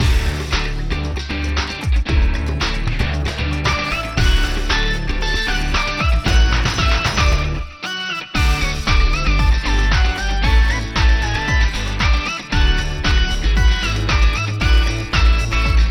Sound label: Breezy Brass Beat Rhythmic